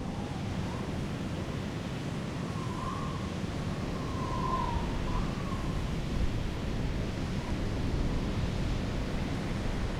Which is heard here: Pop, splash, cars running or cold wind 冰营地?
cold wind 冰营地